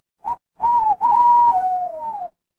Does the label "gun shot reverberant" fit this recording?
No